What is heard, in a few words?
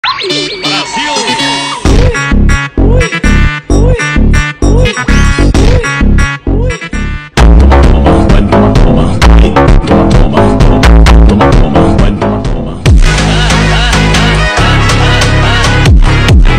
phonk music